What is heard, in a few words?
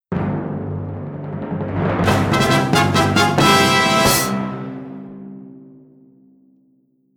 Fanfare